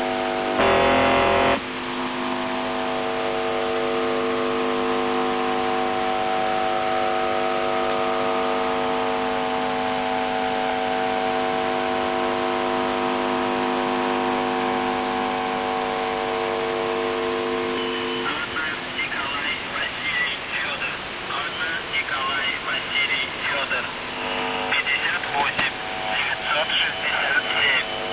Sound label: buzzer usb msg